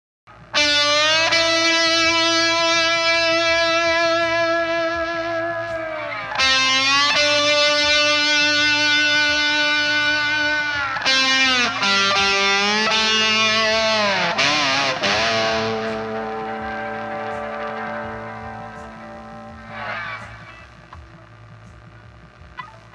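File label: Castledine 9v Overdriver, guitar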